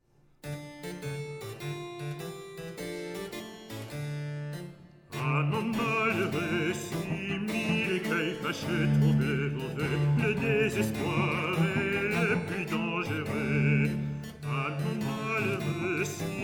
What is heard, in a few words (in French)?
baroque basse; amants malheureux; Alcione